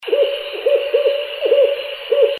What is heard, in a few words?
Strix fulvescens El Triunfo, Chiapas